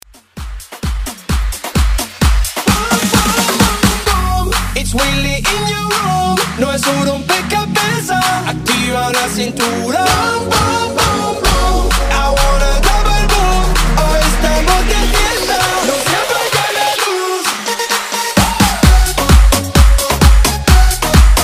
Afrobeat Redrum

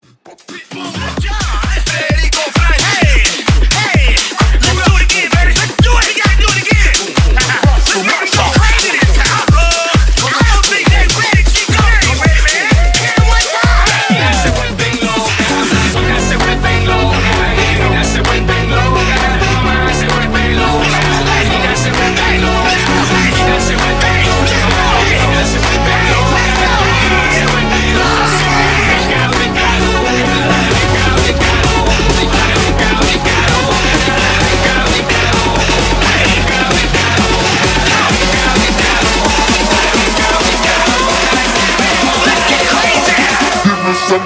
Bootleg 130 BPM

